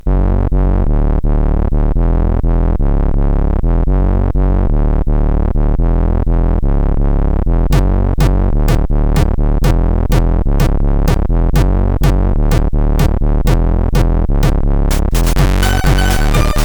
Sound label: Techno, sid CSG8580R5; Killer; Warrior